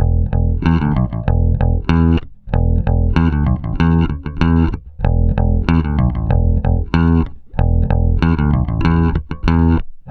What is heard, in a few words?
BALBOA 95, Bass Grooves; USB Soundscan vol.30; AKAI